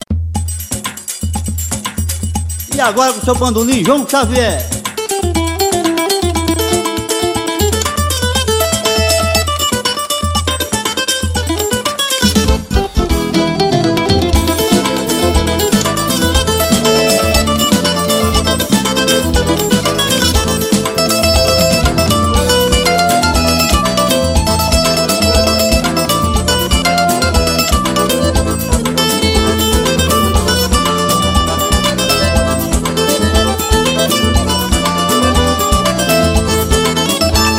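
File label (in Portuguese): instrumental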